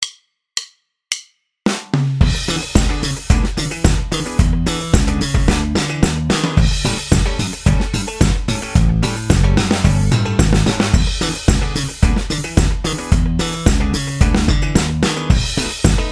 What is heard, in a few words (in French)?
notes piquees exercice, basse; exercices